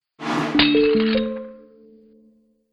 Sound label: Spell Break Stone, magic sound effects